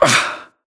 grunt hits; Titanfall 2

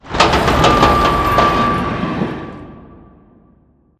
Prop Warehouse Prop Rack Rotate; Titanfall 2; Boomtown

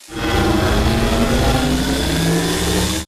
arc cannon chargeup fast mid; Titanfall 2

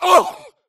grunt death quick; Titanfall 2

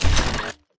footstep shuffle; Titanfall 2

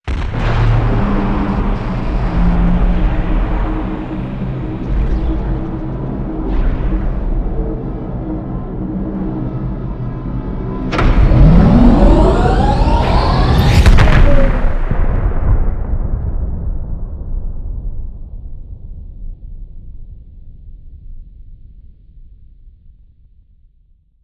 mega carrier leaves; Titanfall 2

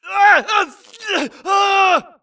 grunt Struggle; Titanfall 2

